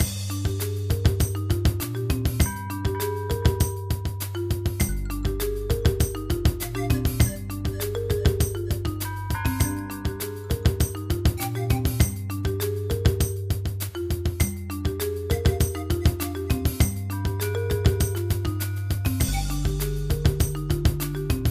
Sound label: Tribal Groove